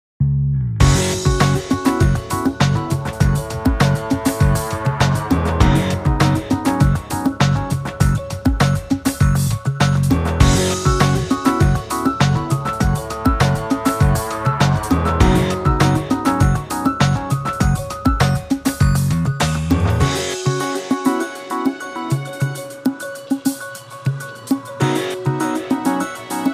Music Menu event

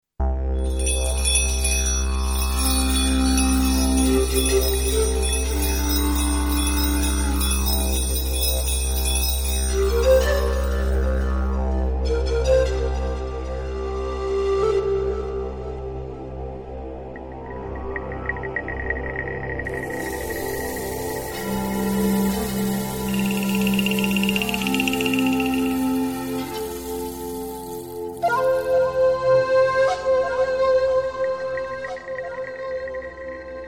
Loop; crime stories from the files of martin myst re

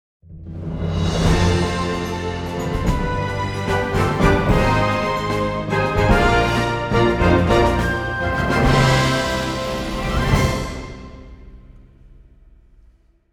Jingle - Victory; TowerTale

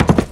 gallop, donkey; Spanish

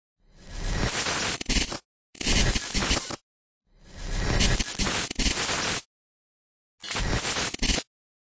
Levelend

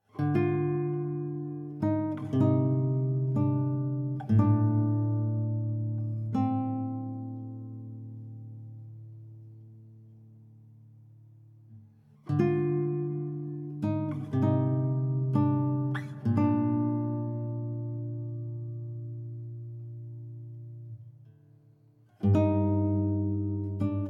Savannah Music

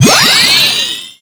Skill Wall magic; BOMBERGIRL RAINBOW; Arcade

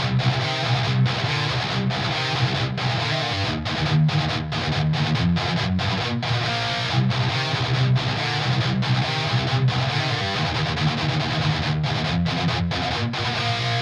Drums and Jingles Camping Guitars; Metal Tales Fury of the Guitar Gods

